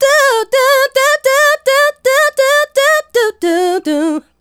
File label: Female Solo, Vocal